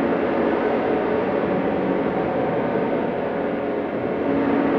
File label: Cracklature, sparse soundscape